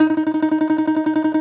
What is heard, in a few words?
DOMRA, Segundo Domra, Plucked Strings